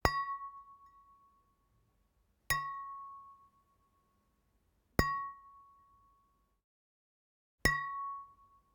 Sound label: Generic Click Glass Small; boom library; The Interface